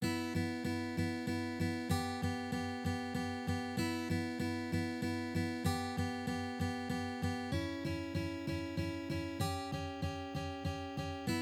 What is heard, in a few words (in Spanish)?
Blues a dos cuerdas, guitarra acustica